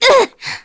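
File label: Damage, Korean; Yanne; kings raid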